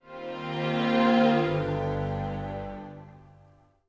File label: Logoff - Strings; w2k